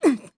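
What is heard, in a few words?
voice collide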